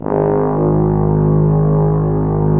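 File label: brass tu, Roland; Roland LA Composer vol. 4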